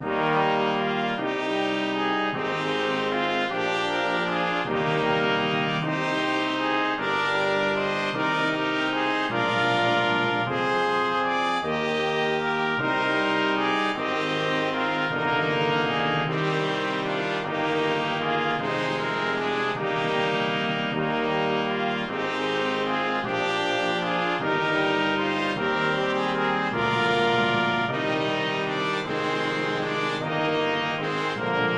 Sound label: Hymn BRASS